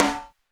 Metal Trash, Snares; Airwindows; BeyondSnares

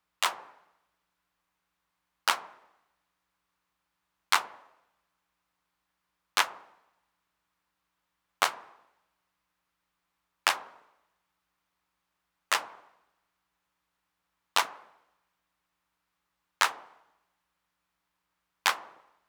clap both, Roland TR-909; Roland TR-909 Ableton Project

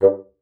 G2, BASSOON; Xsample VOL-6

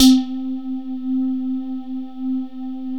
BOUNCE; Masterbits; Vintage Voltage; SQ+FX

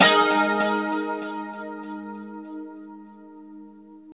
2CHD G#4, Guitars; Spectrasonics; Hans Zimmer Guitars VOL-1; JC-SHEEN

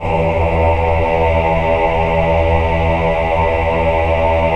VIB E2, VIBRATO, Voices; Spectrasonics

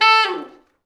T Sax falls, Horns Sax

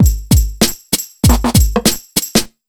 BEAT, Underground Hip Hop; USB Soundscan vol.02; AKAI

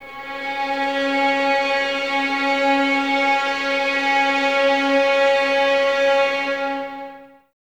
VS C#4, String Ensembles, 11 Violins, Sul Ponte; Miroslav Vitous

